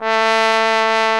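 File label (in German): posaune bass A3, trombone; Best Service; Alpine Volksmusik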